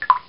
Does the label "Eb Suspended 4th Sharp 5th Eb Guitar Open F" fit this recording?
No